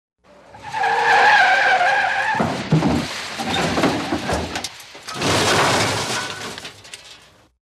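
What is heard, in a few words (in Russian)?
торможение и авария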